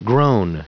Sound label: wo groan